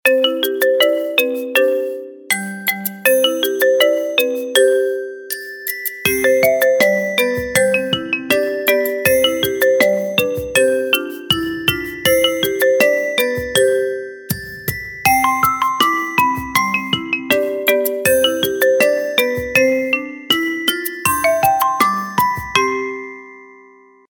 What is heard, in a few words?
Marimba; Qiku Luna Youth